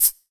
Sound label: SHAKER, Drum Mashines, SR-16 DRUMS; EdgeSounds